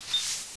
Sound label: Sweep egg; Snavely! 1.0.4